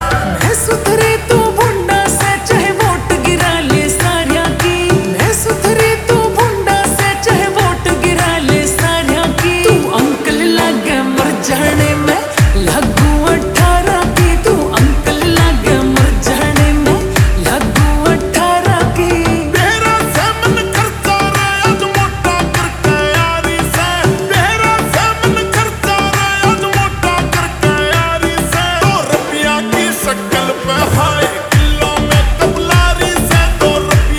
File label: Slow Reverb Version Ringtone